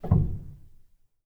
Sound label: rel, Grand Piano; bitKlavier Grand; Piano Bar